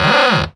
Creak

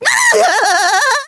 Stunned; stunned by an energy ball; The Lady

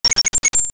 Freeze; Link getting frozen; Zelda

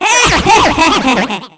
Bubble Laugh

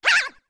Shout

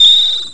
Sword Gleam; Link's new sword gleaming

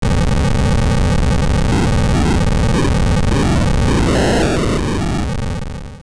Altar Shake; Twinrova's sacrificial altar shaking (also a crypt opening in the Ancient Tomb [OOA Dungeon 8]); Zelda Oracle

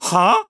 Huh; Gondo; Skyward Sword

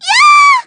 Smashed; Zelda smashed off the screen

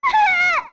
Scared; Pamela (child in Ikana) scared

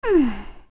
Young Mmm; Zelda

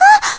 Hurt; Zelda hurt

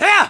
Whoop; Link whooping Epona

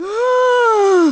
Annoyed; Sera getting annoyed

